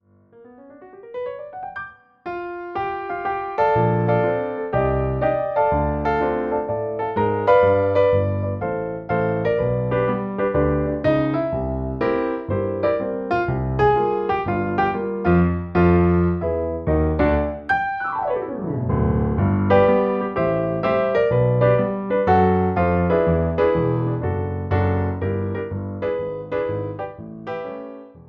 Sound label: Jazz sample